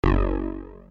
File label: section fail; Kantoku Skin